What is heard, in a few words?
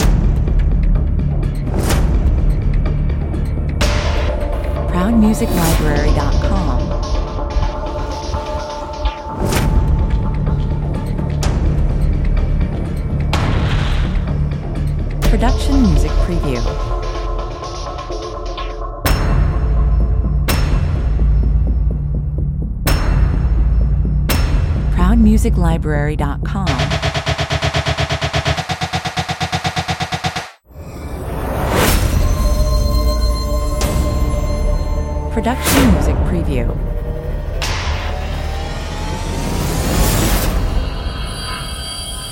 machine gun opener 126 bpm